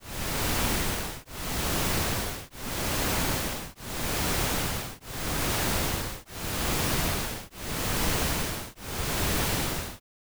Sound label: Pink Noise Sine